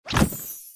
hidden Reward bao Xiang luo Xia

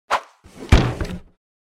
safebox in

